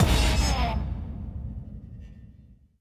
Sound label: Flourish, soundtracks; bolt 2008 pc gamerip